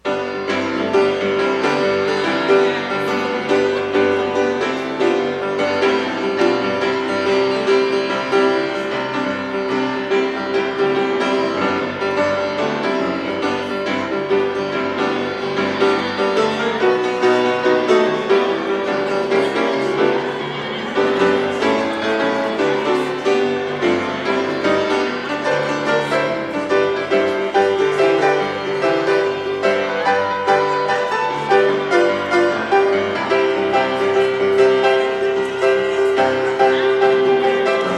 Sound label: postlude piano